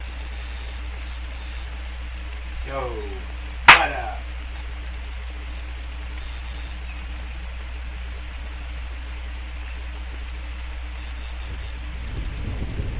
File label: thunderstorm